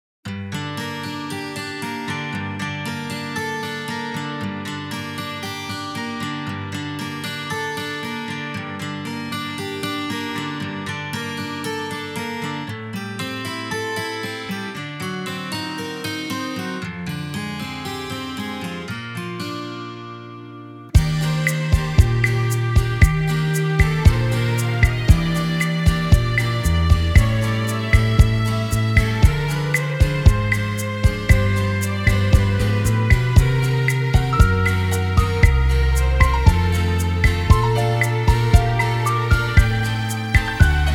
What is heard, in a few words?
GENTLE RAIN